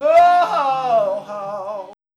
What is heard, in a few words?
wooah how how; ooh ahh